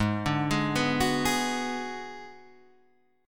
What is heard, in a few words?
Ab Minor 7th Flat 5th Ab, down; arpeggiated; JGuitar